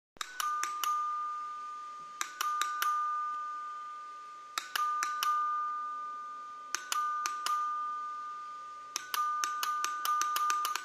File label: Doorbell